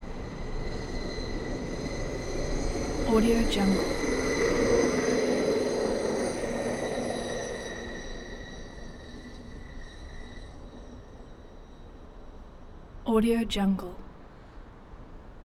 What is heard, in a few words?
train pass by rail inspector engine